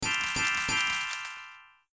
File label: three Sparkles; open toontown resources